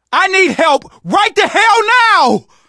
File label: dying, survivor voice, manager